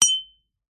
metal pick axe mining or blacksmith hit mechanical gear mechanism accent; ancient game; epic stock media